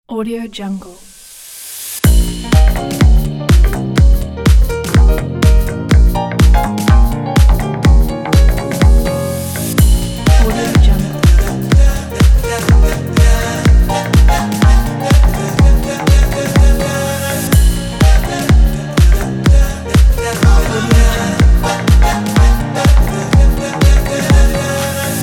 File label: jazz swing ident